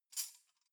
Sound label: cutlery knives handle short